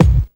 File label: BD, Dance Mega Drums; 90 s Sample CDs; Best Service